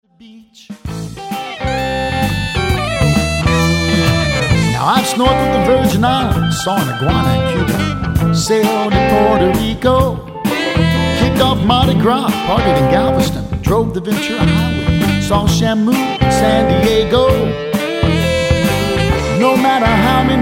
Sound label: Tropical Jazz